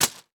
Sports Bag Plastic Bag Of Tent Poles Dropping On Dirt